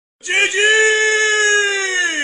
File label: gg sound effect good game